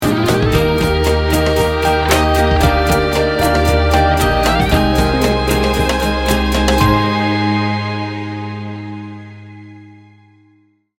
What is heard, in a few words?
motivating and positive sting bumper